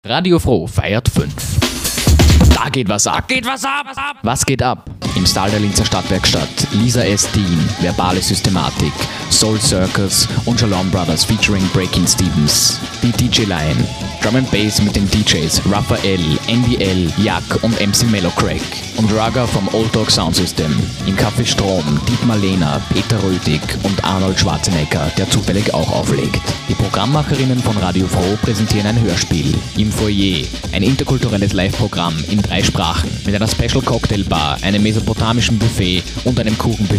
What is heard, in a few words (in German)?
jingle kurz; fro feiert 5